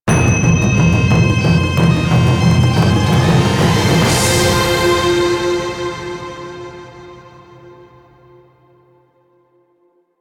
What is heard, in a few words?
Match End, Music; Warframe; PVP